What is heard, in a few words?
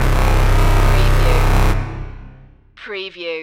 synth stab